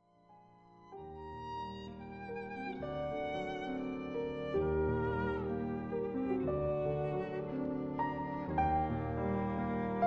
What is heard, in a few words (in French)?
Nocturne violon piano